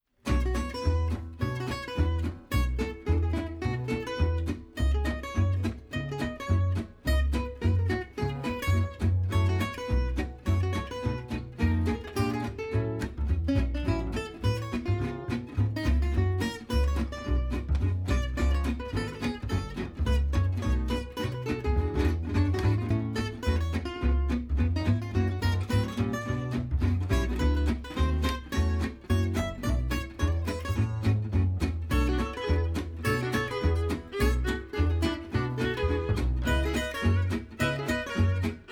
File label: Footing